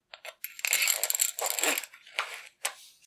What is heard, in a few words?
belt